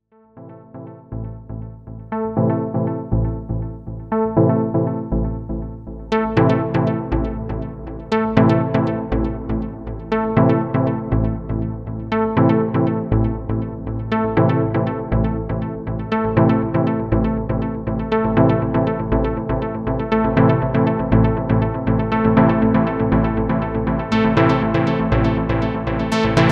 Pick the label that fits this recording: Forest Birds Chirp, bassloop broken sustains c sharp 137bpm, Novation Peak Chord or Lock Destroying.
Novation Peak Chord